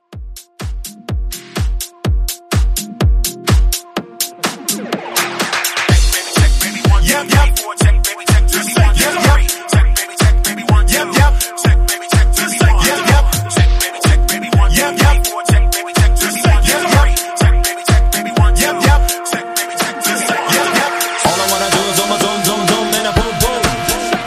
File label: Saxamaphone House Partybreak